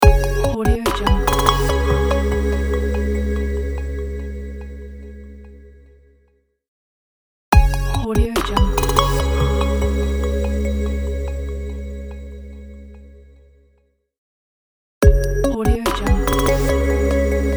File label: glitch logo